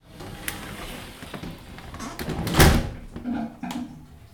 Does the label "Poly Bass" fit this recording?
No